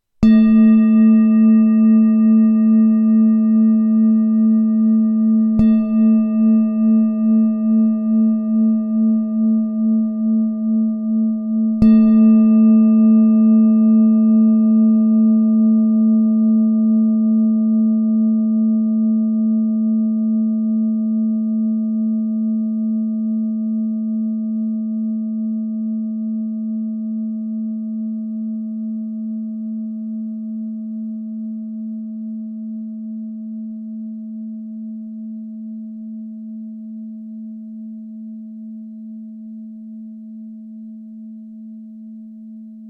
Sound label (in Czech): tibetska misa; 20cm